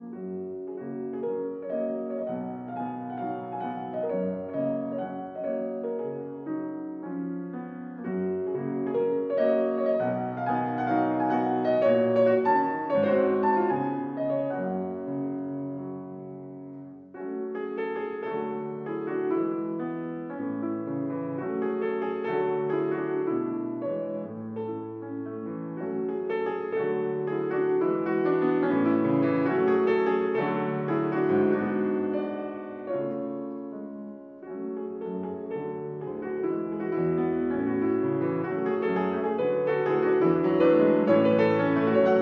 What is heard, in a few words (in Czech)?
Humoreska č.7 Ges dur, klavír; op. 101; 1894; Antonín Dvořák; Květa Novotná klavír